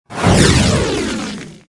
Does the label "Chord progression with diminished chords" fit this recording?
No